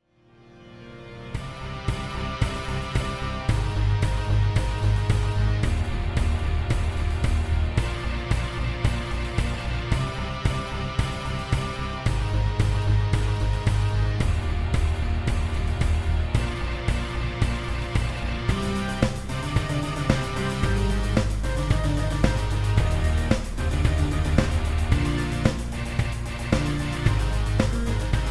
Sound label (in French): instrumental